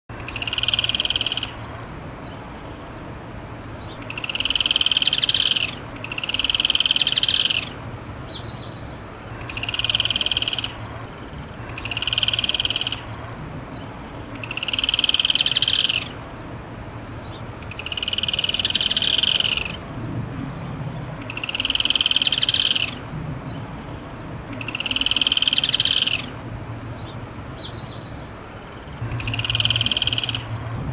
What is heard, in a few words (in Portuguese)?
canto do curutie